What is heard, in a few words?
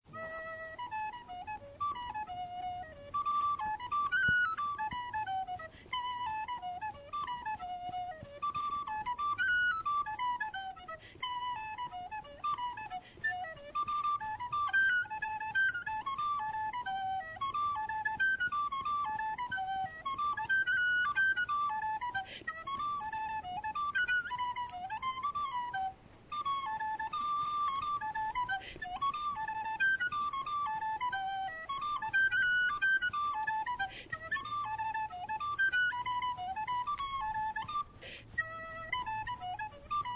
Blustery Fireside (Pipes)